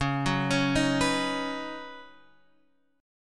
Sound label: Db 7th Suspended 2nd Suspended 4th Db Guitar Drop D; x,4,4,6,4,7; arpeggiated; chord shape; JGuitar